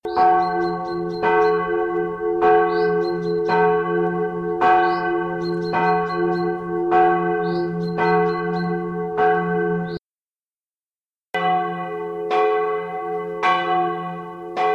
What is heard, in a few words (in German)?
Reichenau Niederzell fis Glocke; bonus kap 3 3